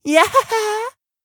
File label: Voices Yeahhh; don t be afraid the first toy